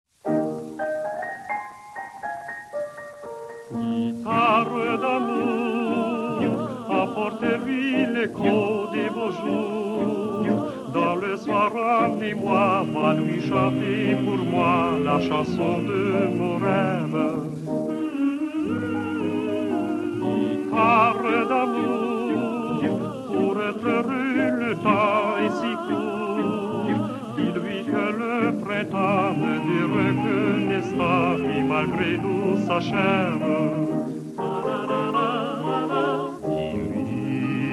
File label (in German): Gitarren spielt auf